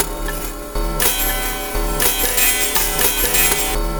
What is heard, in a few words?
Metal Device Beat; Sonic Foundry (Sony Creative Software); Loop Noir; Session 12 - Otherworld Journey